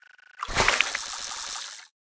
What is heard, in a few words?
start Fishing